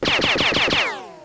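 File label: multi ricochet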